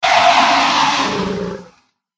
sea monster attack bottom

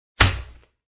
sharkman attack contact middle

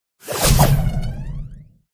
phoenix buff; pets; feniks

